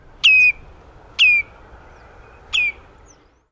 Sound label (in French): chevalier gambette